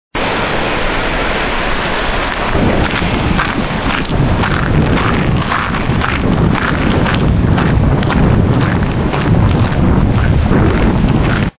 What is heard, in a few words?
Shells Crunching